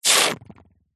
foley liquid squirt hard fast foamy frothy bubbles